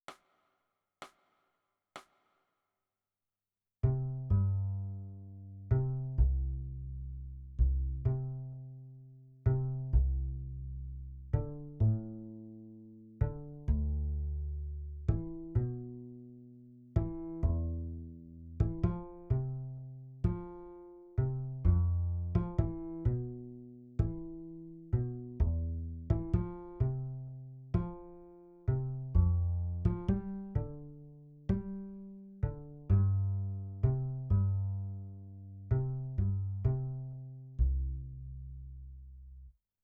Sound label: Bass